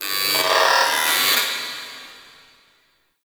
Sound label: Robotic Chatter